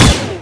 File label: electro impact, weapons